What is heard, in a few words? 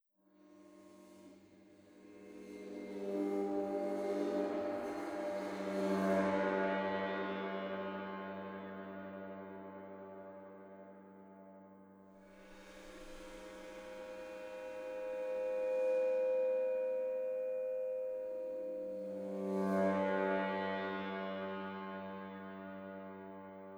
Metal